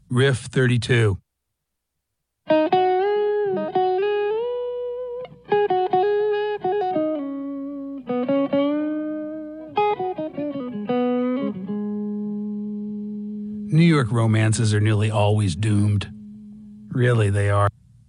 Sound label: Riff